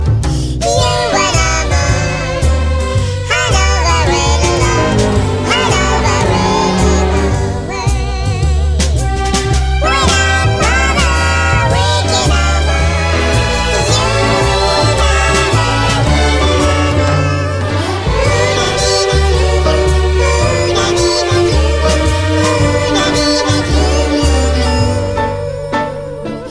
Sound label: ukelele; the lums